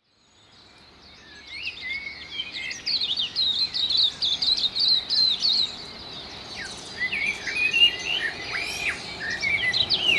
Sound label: auckland dawn chorus